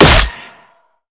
basicattacks; Zyra

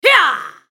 ultimate effort; Sejuani; Character Voices - English

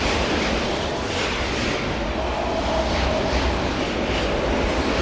spectral loop; LoL; yorick

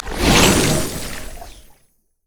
passive heal; LoL; zac base

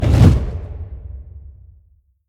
summoner spell base ignite; LoL

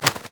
ravens animated win; LoL; emitters map8; odin

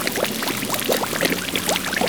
void ooze loop; LoL; kogmaw

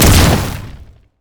landslide; LoL; glacial malphite

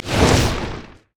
basic attack; LoL; brand

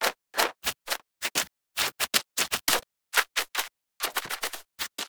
emote dance; quinn

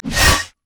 basic attack; LoL; urgot

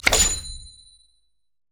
pick a card; LoL; twistedfate

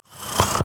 lizard effort; LoL; monsters

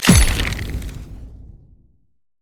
stacked deck; LoL; twistedfate

